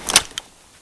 svd clip out

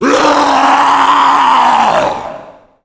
Tank Yell; zombie plague

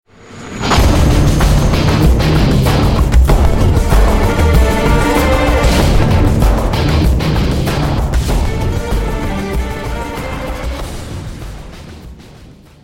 zombie apocalypse

